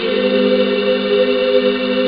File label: chord; cadaver; Amiga Soundtracker Sample Packs